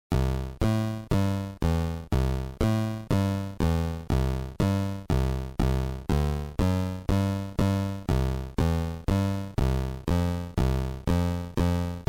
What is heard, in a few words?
bass, steel